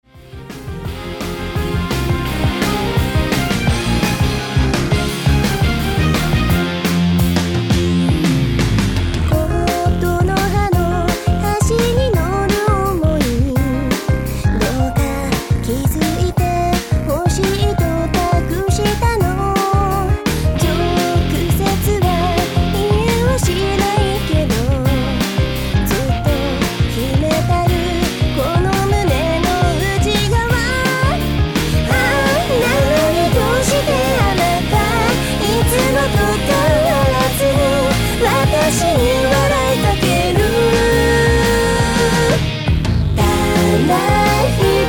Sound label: aah